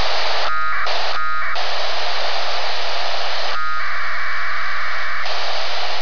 telemetry downlink; mir

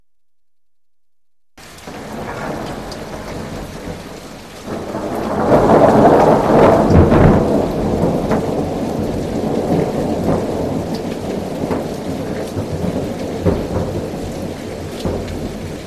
thunder loop

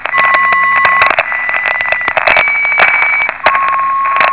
VLF Omega 10 kHz navigation

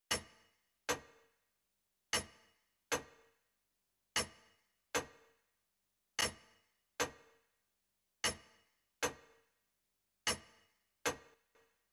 tictac

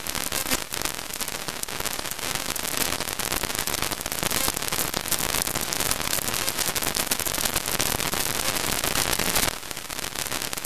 ads-b 1090 kHz AM

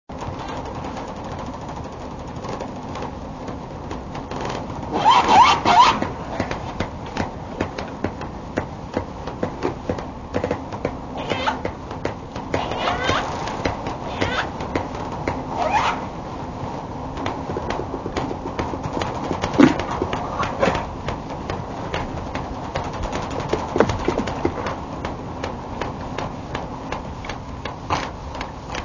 orca calls echolocations; cetaceanresearch

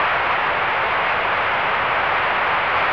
solar flare x5

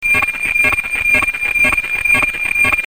APT synthe; maxmsp

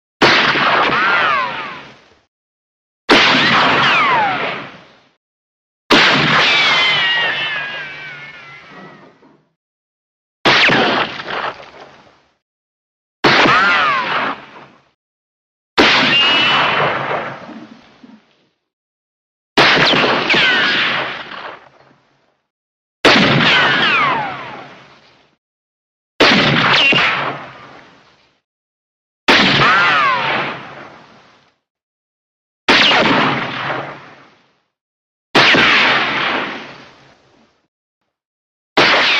Winchester 1873 single shots

